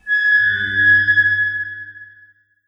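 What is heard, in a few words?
underground metal; blackforest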